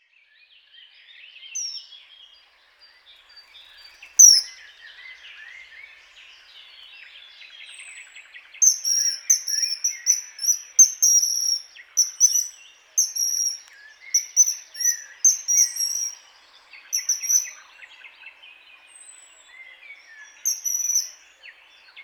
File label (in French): Martin pecheur d'Europe, oiseaux; audio les oiseaux